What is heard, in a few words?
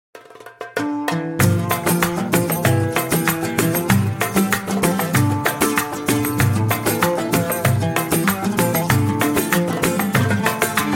arabic mix ringtone